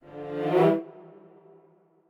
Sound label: strings